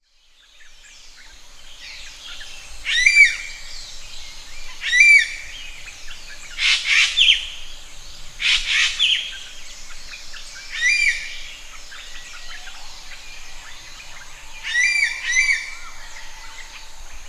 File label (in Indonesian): suara burung ekek layongan atau cissa chinensis